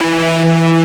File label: Melody Shot E, dubstep; One-Shots; Melodies; Glitter; Ghosthack; Dubstep Sounds 2025